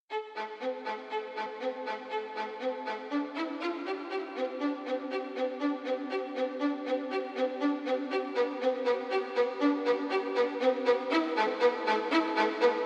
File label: voice of nature 432 hz